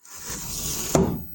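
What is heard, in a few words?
sliding door close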